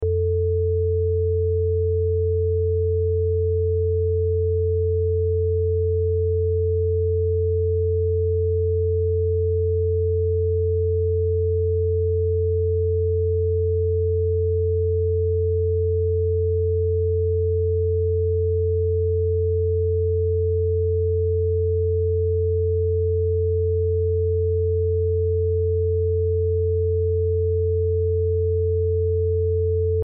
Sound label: stereo test